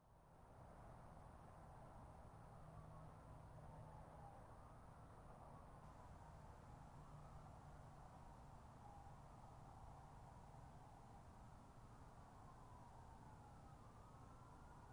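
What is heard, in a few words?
ambience valley sirens coyotes; ams; sonniss gdc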